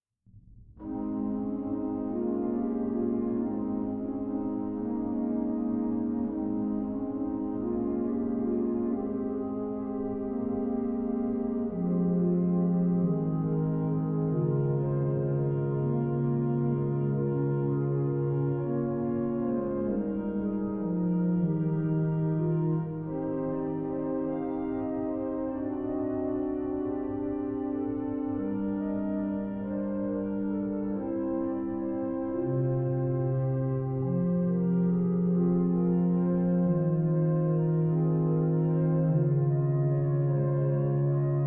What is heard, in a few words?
joy, organ